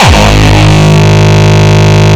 Longkick, kicks

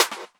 Snares, Elektron MachineDrum SPS1 MKII

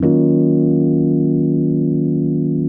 RHODES; Houseworx

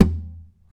TAP, FLAMENCO Guitars

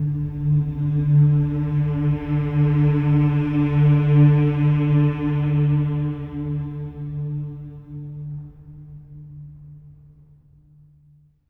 MM D3; SWELL MMS

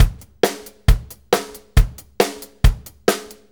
4-4 Loop, Drum Loops, Full Kit Loops; Sonic Foundry (Sony Creative Software); Loops & Samples Drum Tools

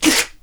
NOISE, CLASSIC VOX; Best Service; Hallelujah

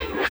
MOV; Total Control - Action Cutz; COMBAT

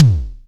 BDRUM, Simmons SDS5

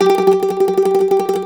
MANDOLIN

